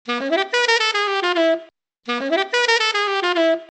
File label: Sax, Deurbellen